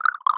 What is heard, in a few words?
Roar; CHR ENM Mung